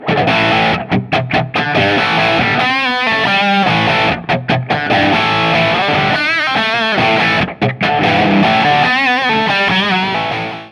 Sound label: Crunch Strat Boost on; Gain 12; Treble 12; PPIMV 17; VVR 17